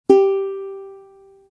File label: uku g, accordeur